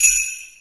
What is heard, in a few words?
hidden